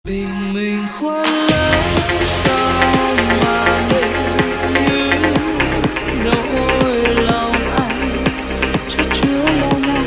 remix

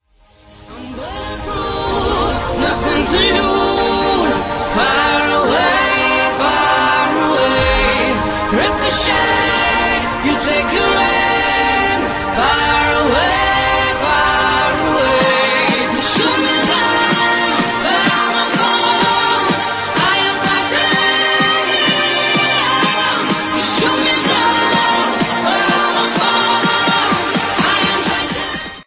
electro

